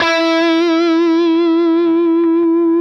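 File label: fuzz guitar vibrato; MAYFUZZ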